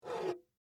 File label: Whiskey Bottle Slide, Movement; Foley; Drink Mixing Sound Effects